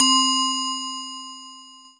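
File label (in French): "do", French; Mobiclic; Jeunoel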